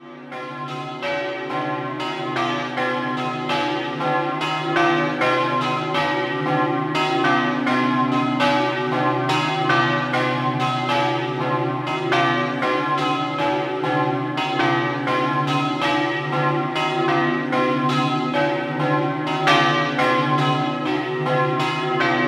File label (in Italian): Doppio, campane; Basiliano